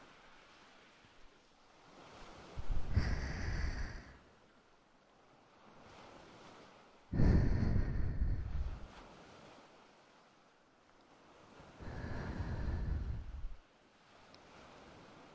幸せちゅっちゅ添い寝, ASMR

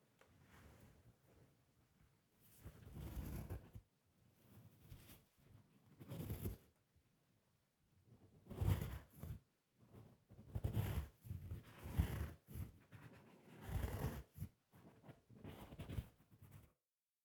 耳かきシーンまとめ, ASMR; ＮＴＲ音声手紙(LR逆ver)

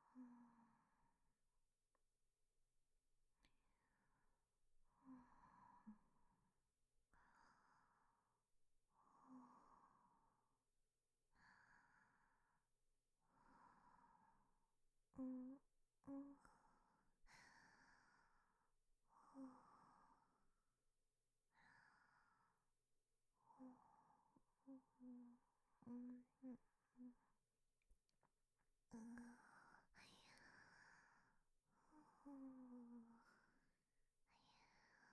左 寝息, 彼女の寝息

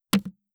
ストン

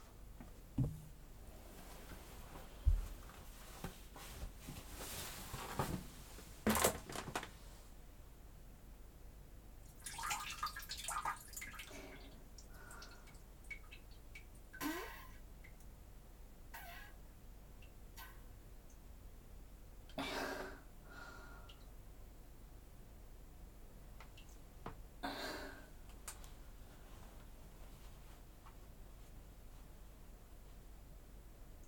放屁放尿脱糞音声集(きばっている音も含めたロングバージョン)

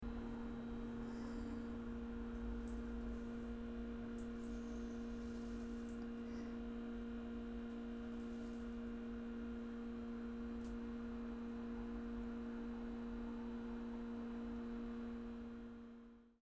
しょろしょろ; 生撮り！; 盗聴; 吐息あり

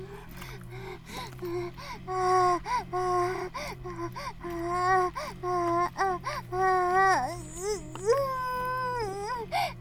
バイブ放置, ASMR

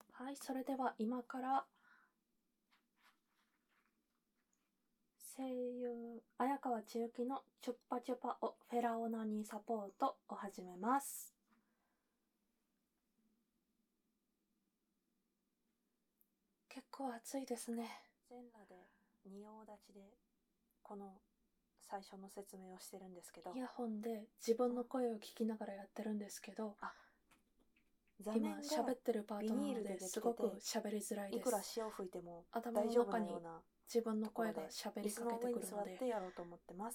ノイズ部分カット ちゅっぱちゅぱ フェラ + オナニー 実演 ステレオ; オマケ 鈴の音などをカットした音声

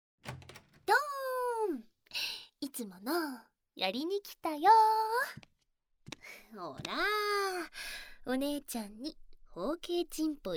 耳舐め しながら 包茎手コキ, SE付き

